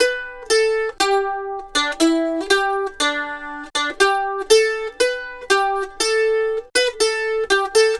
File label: Mandolin Lead; Appalachian; Continental Drift Aftershocks